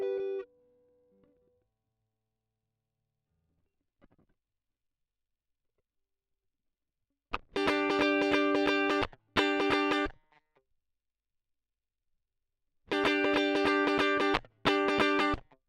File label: Guit Solo Line, RNR for piano